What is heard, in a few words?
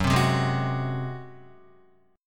F sharp Suspended 2nd Flat 5th F sharp, Guitar; strummed; JGuitar